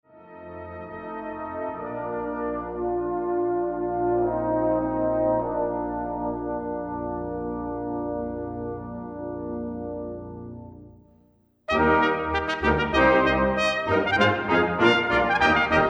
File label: Trumpet Voluntary